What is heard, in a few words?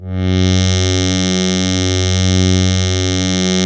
SYN Space Wave; Best Service Gigapack 1